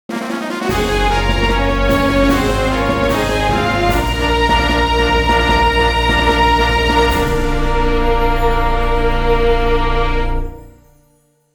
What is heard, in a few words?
Jingle Stage Start; Gensou Shoujo Wars: Dream of the Stray Dreamer; Broute